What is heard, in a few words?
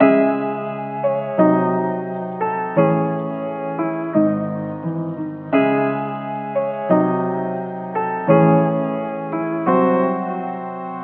piano, Sad